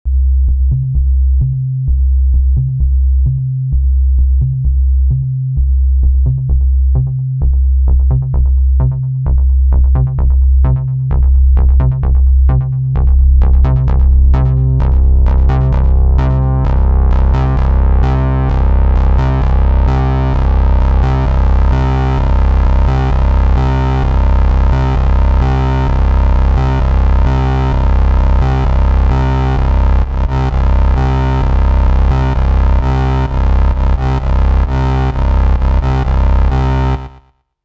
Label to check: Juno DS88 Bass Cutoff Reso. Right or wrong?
Right